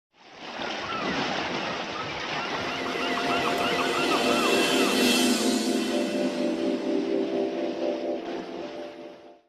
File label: water tune ringtone